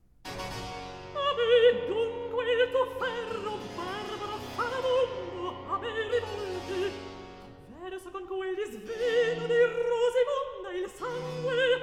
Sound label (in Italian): Recitativo; A me dunque; Handel Masterworks; Act I